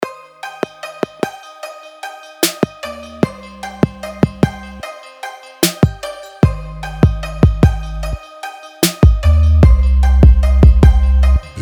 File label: trap